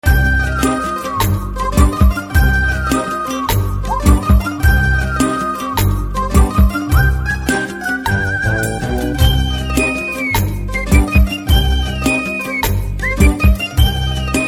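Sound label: background music Telugu Ringtone; Darling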